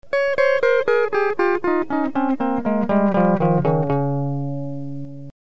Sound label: delay 250ms